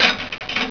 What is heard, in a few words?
BOUNCE